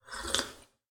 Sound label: Drink; add drink and enemy sounds; fatal-exception